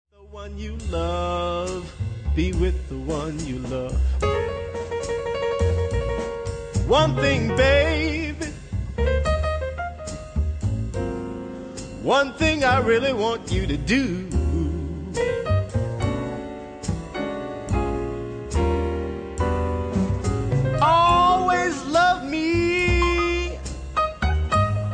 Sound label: Slow Blues; teaching listening